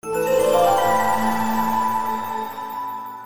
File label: chime; bruitages